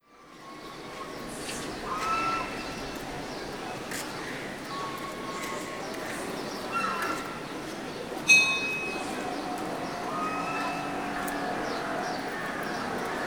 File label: City place quiet birds bell light activity and animals, South India; Charlie Atanasyan; Faunethic